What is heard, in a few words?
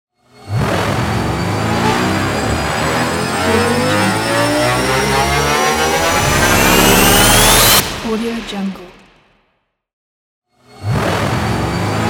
trailer tension build